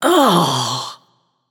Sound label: ouch; babayaga; smite